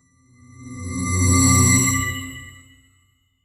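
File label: magical spell cast glowing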